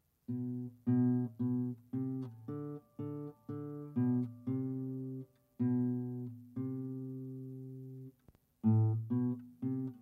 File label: Instrumental